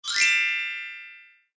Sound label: gliss